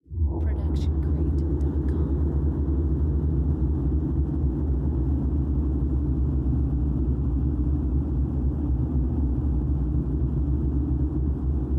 Ambience Descending Rising

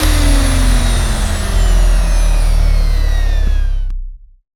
machine power down; soundscrate

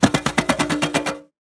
train starting; soundscrate

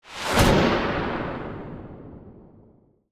Lightning Crack; soundscrate

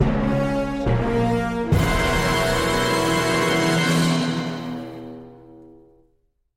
dun dun dun; SoundsCrate

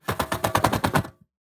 train going; soundscrate

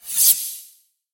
anime sword swipe down

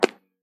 single step asphalt

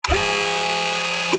Robotic Motor; SoundsCrate

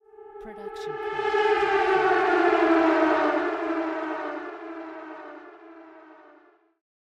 intense string fall; soundscrate

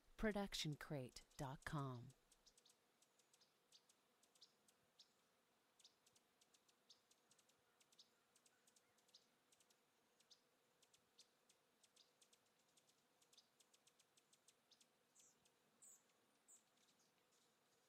Birds and Nature

